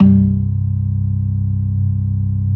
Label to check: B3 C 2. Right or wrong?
Right